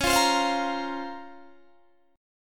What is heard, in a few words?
Db Major 13th, Guitar Standard; strummed; JGuitar